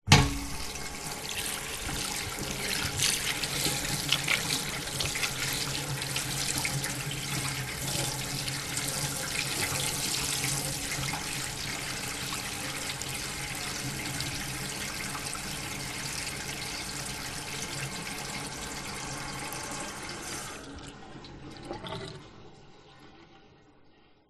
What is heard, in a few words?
public places public toilet tap faucet press on wash hands off